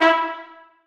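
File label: trumpets staccato e3; Sonatina Symphonic Orchestra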